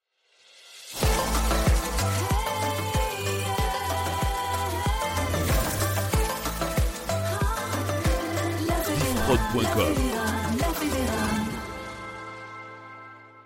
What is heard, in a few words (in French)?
Jingle Chante Long; La Federam